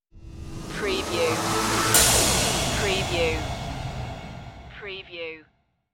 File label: pop tv stinger